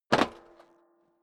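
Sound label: step boots metal rattle jump, footsteps; schritte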